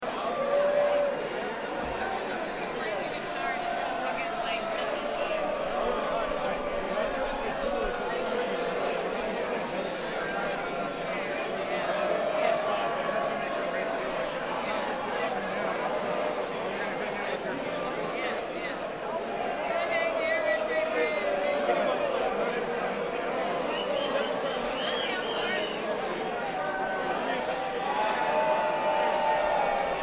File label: Crowd